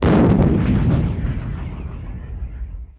explo flange; impacts explos

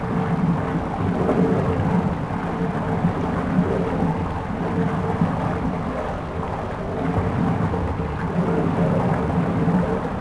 fuel pump; Star Wars Knights Of The Old Republic II; XBOX

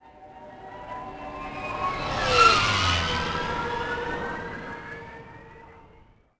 flyby; Star Wars Obi Wan; XBOX

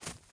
fs grass, footsteps

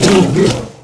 hit, creatures; Star Wars Obi-Wan; XBOX

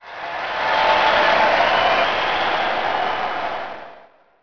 low crowd; MX2002 Featuring Ricky Carmichael

